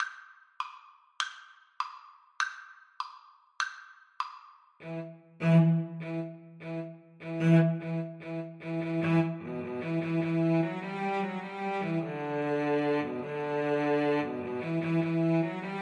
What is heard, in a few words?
Cello Duet